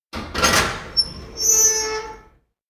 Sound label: door studio open; aino; anemoi